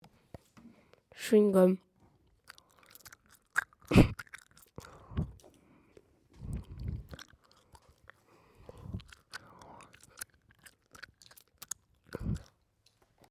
chewim gum

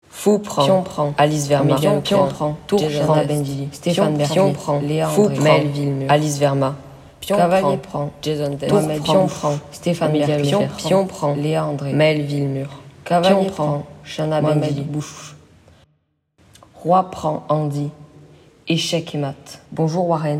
générique de fin

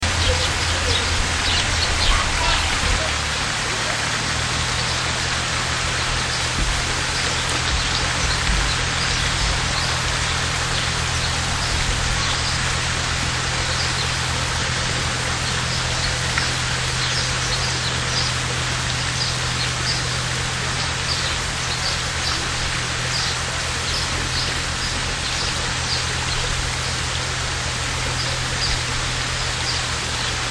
vent dans les arbres et rivière

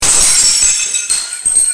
casser assiette

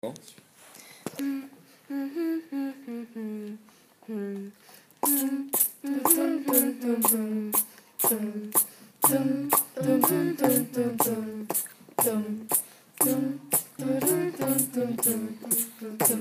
Beatbox Impro

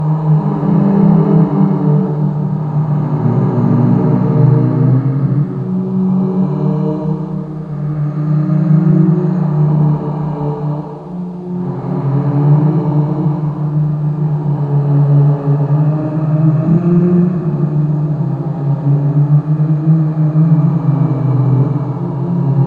fantômes

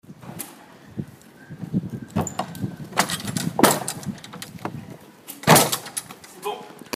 Volets

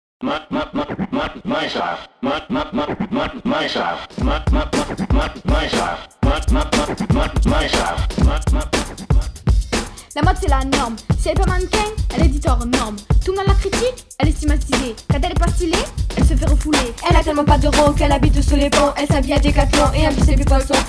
Rap musique

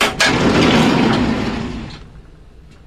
tiroirs ouverture

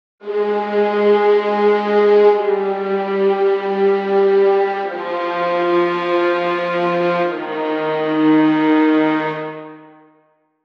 LEITMOTIV

